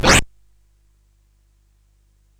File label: sid CSG8580R5; Double Dragon